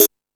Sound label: Hip House, drum machine; E-MU Pro-Cussion-1